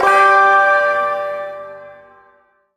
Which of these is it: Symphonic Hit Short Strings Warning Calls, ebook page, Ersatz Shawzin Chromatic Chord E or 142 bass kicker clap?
Ersatz Shawzin Chromatic Chord E